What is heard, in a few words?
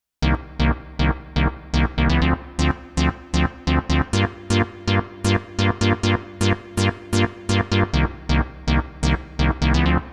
2 Chords, synth